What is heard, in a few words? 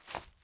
foliage step